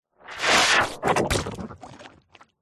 science fiction movement slimy weird; zapsplat

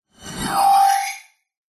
science fiction door open slide; zapsplat

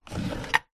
household drawer wood small open; zapsplat

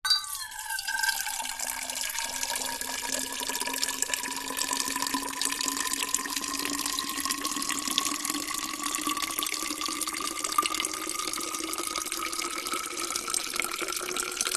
drink metal thermos flask fill with water slow

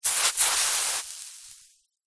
horror acid burn; zapsplat

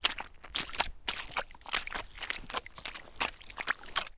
footsteps in soggy wet mud; foley

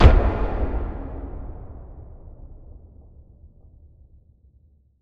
Impact Boom Metallic; bottlerocket

